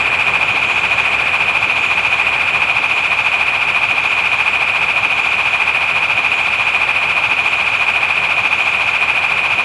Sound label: inhale loop